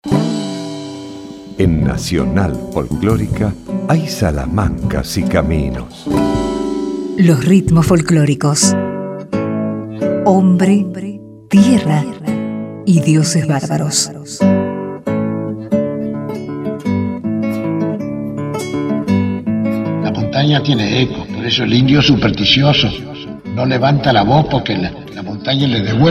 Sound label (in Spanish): Ritmos Folkloricos